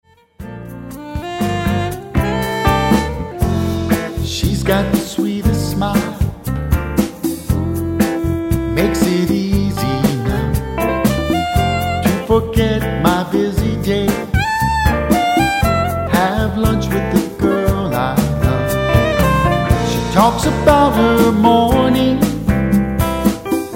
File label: Tropical Jazz